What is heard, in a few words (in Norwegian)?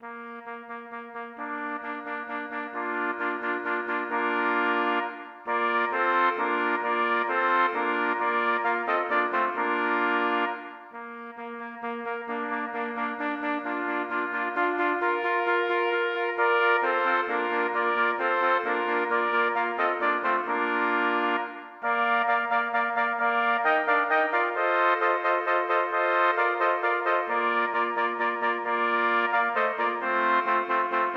For fire trompeter